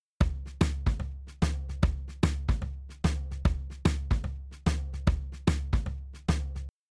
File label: Drums, shuffle drums; WDR2